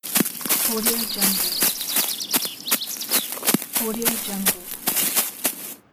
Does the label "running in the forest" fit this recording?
Yes